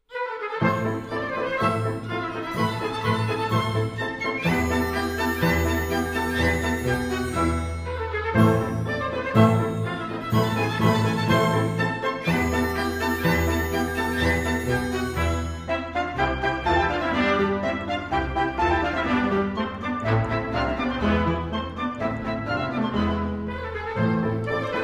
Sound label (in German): Tuerkischer Marsch